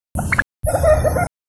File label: chirp